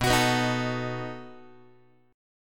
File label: B 7th B down Guitar; B7 strummed; x,7,9,9,7,8; JGuitar